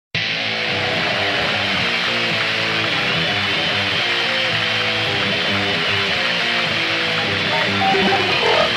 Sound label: Guitar